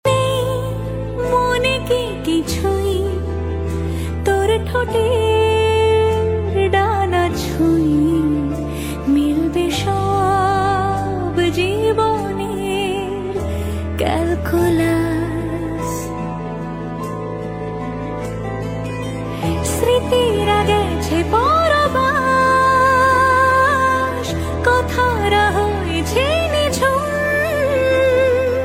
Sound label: bengali ringtone